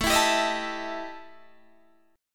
E Minor Major 7th Double Flat 5th

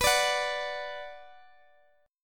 B Minor B Guitar Open G

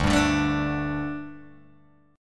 D Suspended 4th D, Guitar, Open G

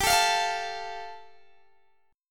G 7th Suspended 2nd, Guitar DADGAD

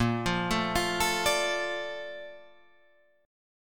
Bb Major 7th, Guitar, Drop D

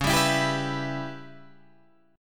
C sharp Minor 7th Flat 5th C sharp Guitar Open G

